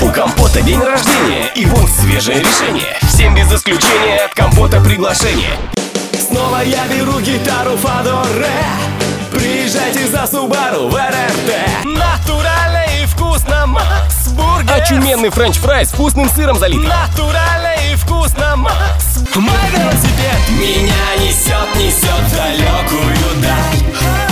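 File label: vocal Russian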